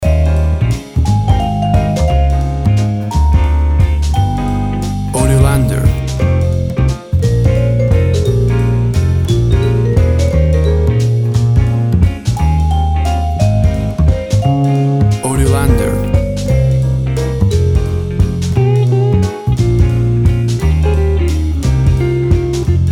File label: Toe Tapping Jazz